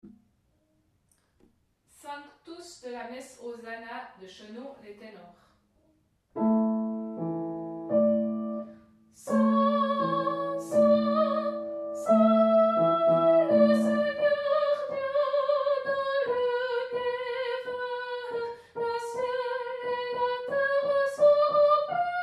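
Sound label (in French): sanctus messe Hosanna ténor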